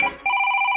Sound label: dring dring